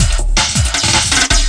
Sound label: LOOP, 160 BPM, Drum & Bass